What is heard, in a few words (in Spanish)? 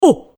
golpes